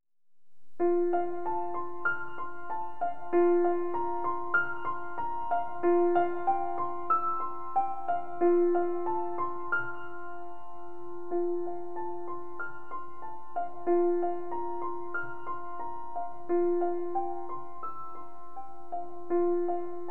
Chimes

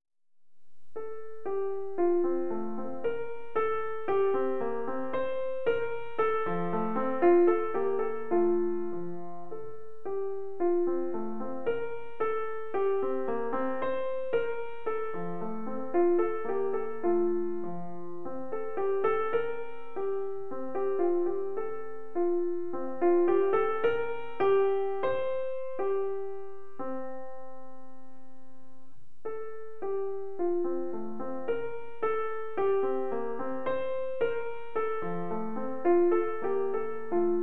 Melody

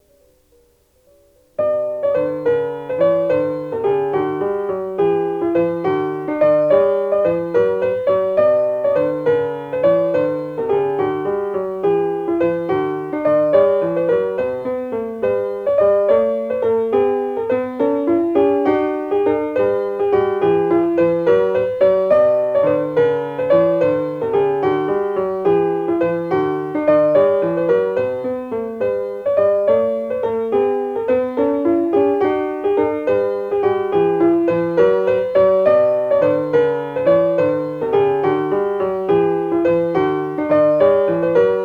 Gigue

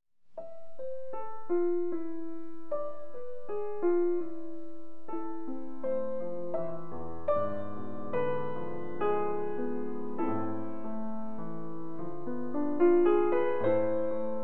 Piano Rhapsody

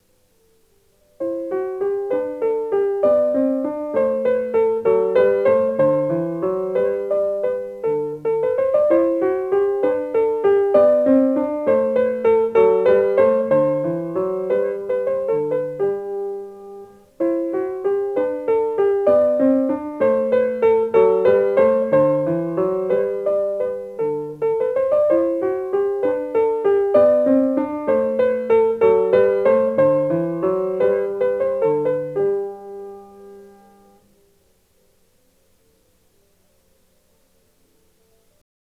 Antique Melody

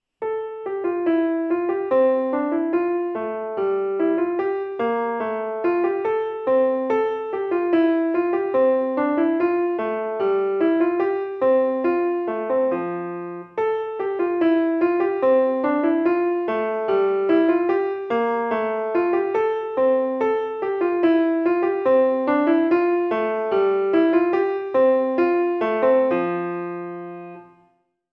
Trumpet Tune

